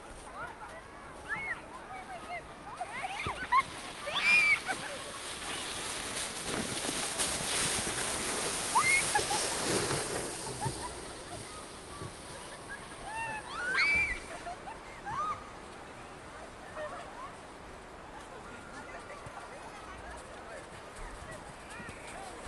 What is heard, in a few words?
ext day snow hill fast sled bys walla laughter playing; ambix; sonniss gdc